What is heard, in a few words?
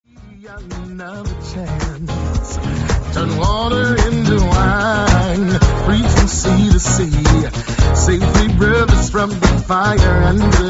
gospel